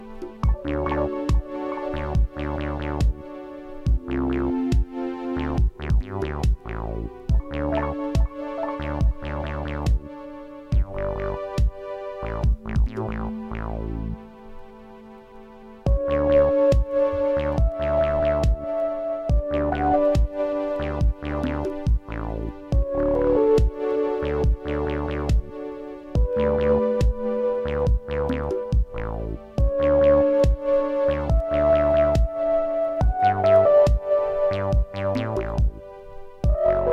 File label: instrumental chillwave; animal crossing